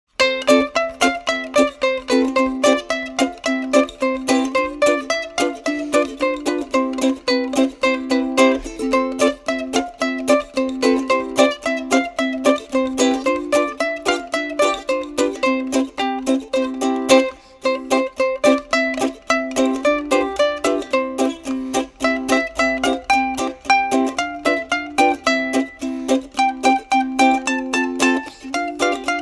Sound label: Instrumental; Kukuli